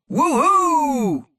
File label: woohoo